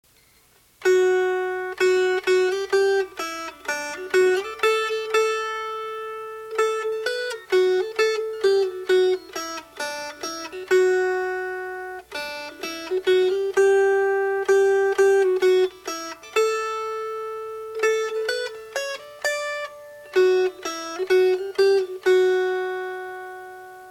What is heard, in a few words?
Melody